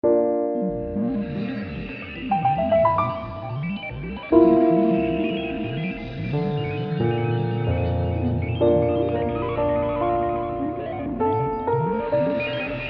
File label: bgm